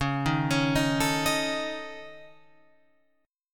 C sharp Suspended 2nd Sharp 5th C sharp Guitar Open F; C#sus2#5; arpeggiated; JGuitar